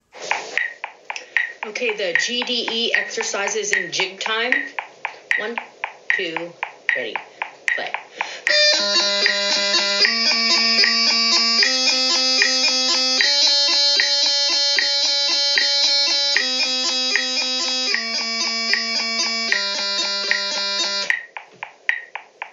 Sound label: Jig exercise GDEs, pipers